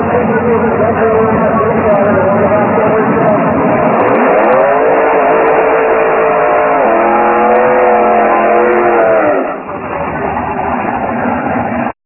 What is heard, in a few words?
duster440, burnout; moparts